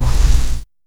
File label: swipe error soft